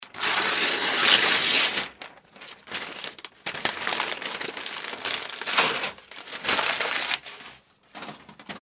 paper rip

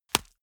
small punch fleshy

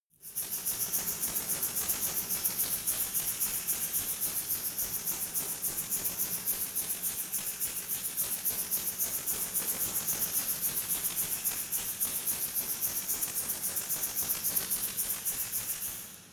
resonator rattle slow hard

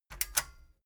metal button stereo click disable fast

